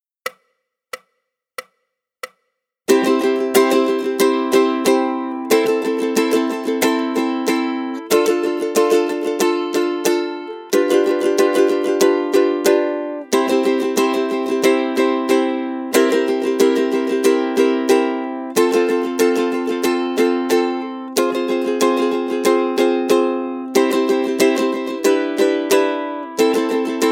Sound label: rhythm study, ukulele; grade3